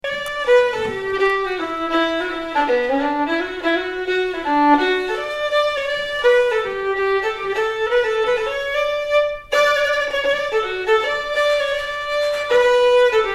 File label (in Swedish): schottis